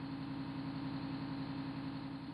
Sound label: Motor; o carro desloca-se para a frente e produz o som Motor